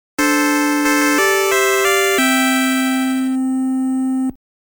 ステージクリア; メルヘンヴェール

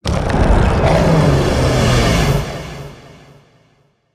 Umekigoe; Se Solo Umekigoe 01

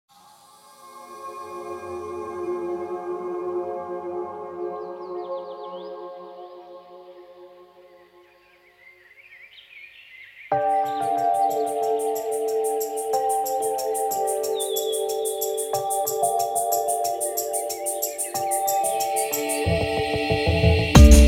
森林ステージBGM Loop; Tower of the Approval; Forest

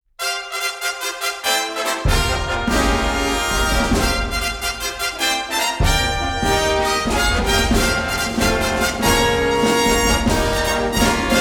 グロウアップレース ファンファーレ

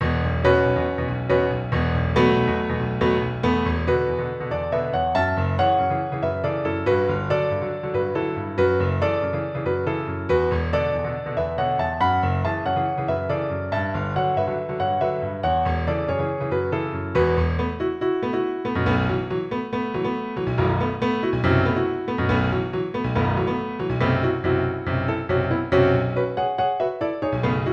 即興曲 E Minor （断片）

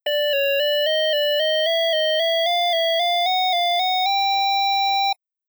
バルーン; メルヘンメイズ(PCE)

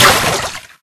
足音(水); Misao

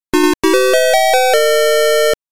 チャレンジ・ステージ開始の音; super pac man

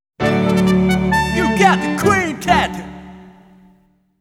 ミュージック・ジングル; You Gotta Quintet Songs; ユーガッタ・ミュージック・ジングル (シャープ)

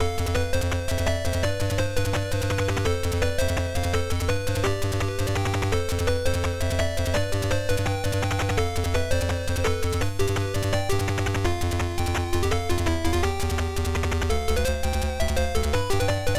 PSG版; Xak II; MSX

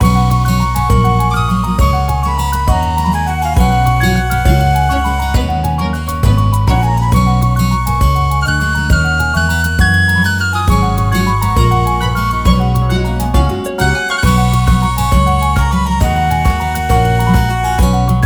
庭師BGM; Tower of the Approval; こころのはな

